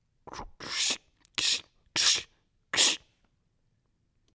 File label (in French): pshit pshit; Liquide; Menage; mouth-fishing